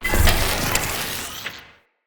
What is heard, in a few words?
Galvanic Zapper Warm Up